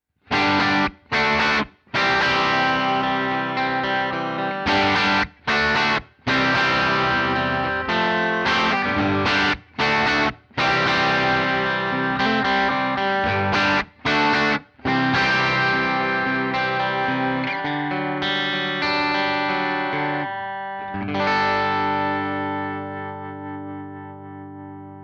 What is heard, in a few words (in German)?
Chrunch Cords Steg SC